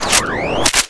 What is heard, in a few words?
Gun Draw Select Scifi, Weapons Foley; SFX Kit